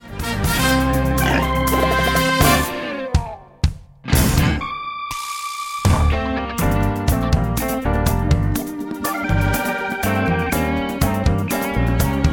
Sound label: Accompaniment; Wickershams; Wickersham & Jungle Citizen